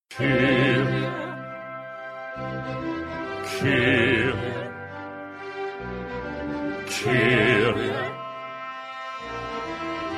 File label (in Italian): bassi kirie